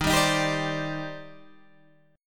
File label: D sharp Major 13th, down, Guitar, Standard; D#M13 strummed